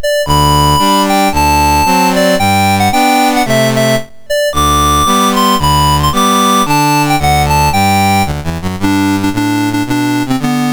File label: MOS6581R2, National Anthems; Guinea Bissau